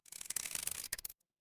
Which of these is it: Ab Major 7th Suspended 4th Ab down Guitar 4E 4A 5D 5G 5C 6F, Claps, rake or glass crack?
glass crack